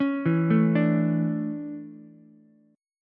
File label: D Major 7th Suspended 2nd, Ukulele; DM7sus2; arpeggiated; JGuitar